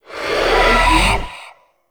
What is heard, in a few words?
Rifle Void Charge; Weapons; Warframe; Zariman